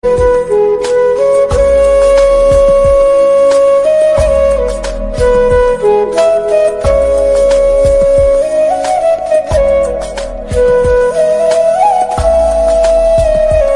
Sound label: flute ringtone, bollywood